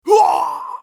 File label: scav wound; eft